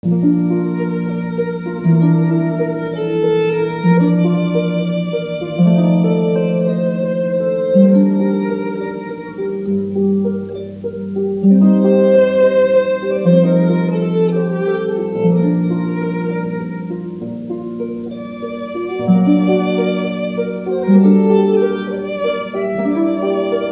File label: ave maria schubert, arpa violino; Comunione; Ave Maria F. Schubert